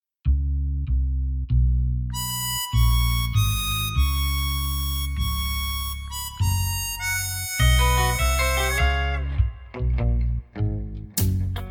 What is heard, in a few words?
Gb No Backing Vocals, backing tracks